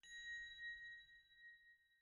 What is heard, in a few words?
reel Bounce; goldengrimoire